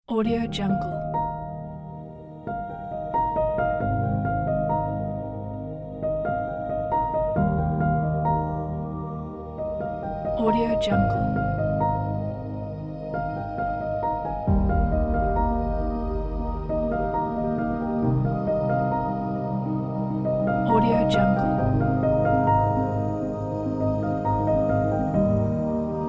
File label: ethereal inspirational piano; eberlemaxim